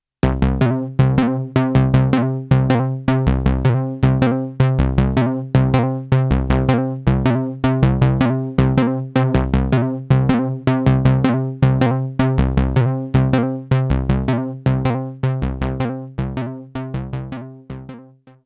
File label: Behringer MS-5